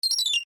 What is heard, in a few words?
UI Confirm, User interface feedback sounds